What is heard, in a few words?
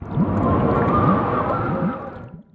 poison; allods2d